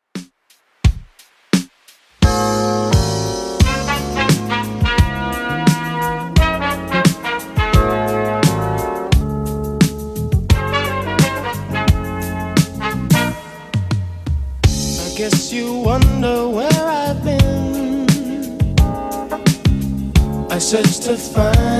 70s Redrum 87bpm

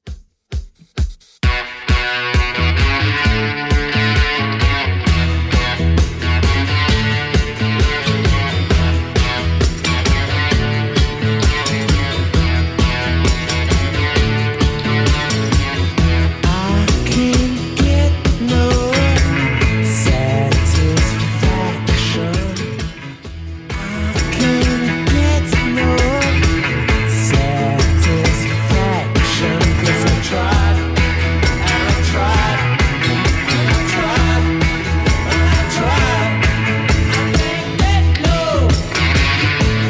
60s 132bpm; Redrum

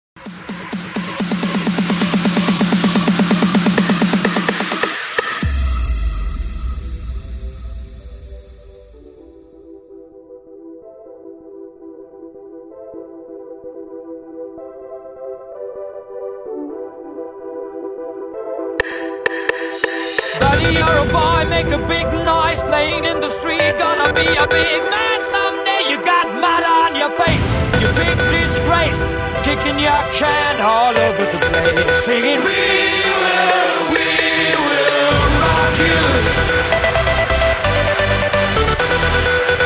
Epic Festival 128 Bpm

